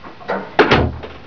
TUER ZU

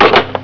TELEFON

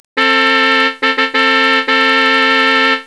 Hupen

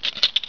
BESTECK

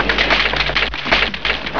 FEUER

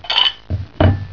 Teller

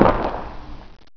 Gewehr

